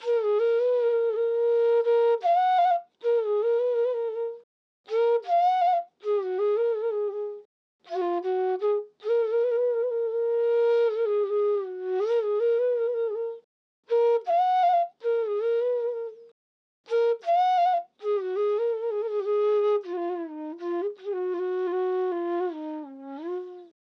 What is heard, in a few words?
Hip Hop Flute F maj 80 BPM